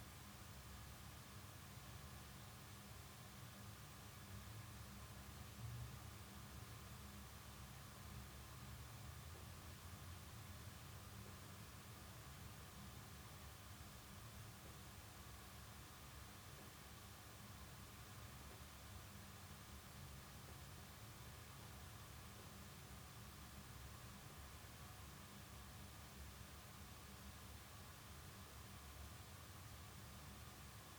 indoor small room; teardown; ps5 windows xbox series xs

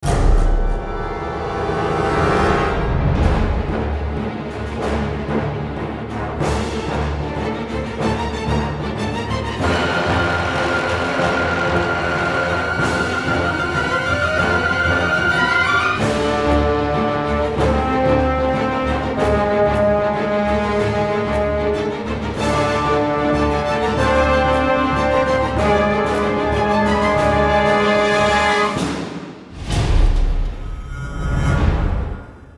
Pre-battle Loop; marvel contest of champions android ios gamerip 2014

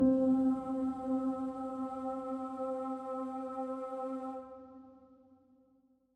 Cave Music Energy Harmony Note C3

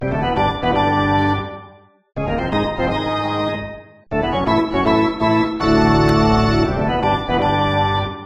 Ditty

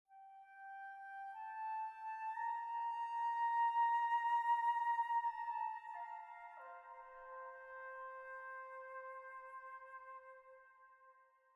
Flute 58bpm 4-4

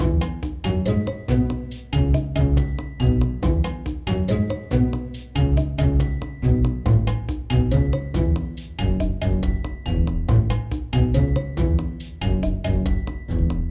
Mood Music

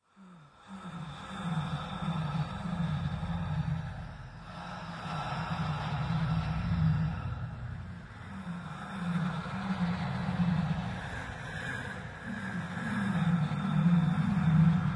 Breath sound; Blood Lace: A Gothic Novel

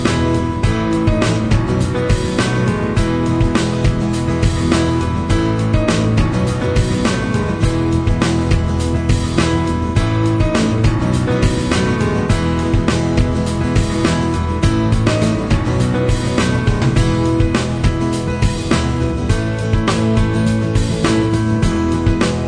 Ingame Music; 50 Classic Games 3D; 3ds

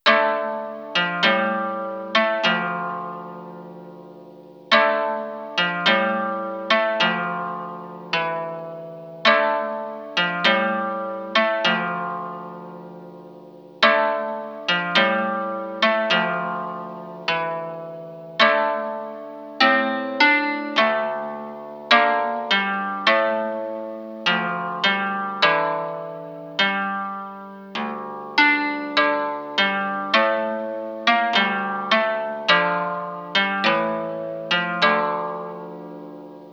Harp; Domestic - Harp Rome

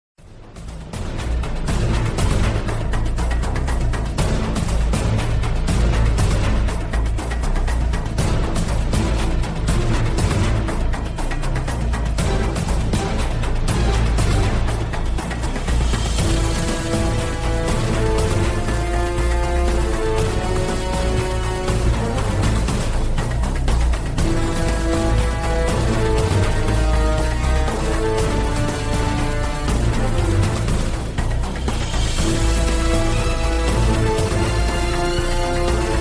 music battle; Gyakumei Tsukai no Shounen; Android

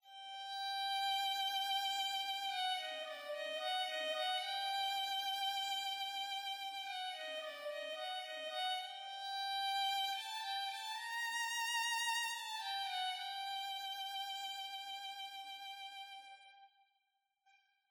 Pizz Violin; Sarco

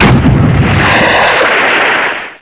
EXPLOD

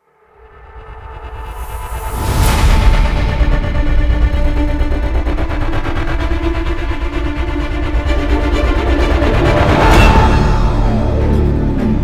Multiplayer Venice Start; Modern Combat 5 Blackout

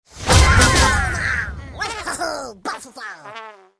Menu Startup; Rabbids Go Phone Again

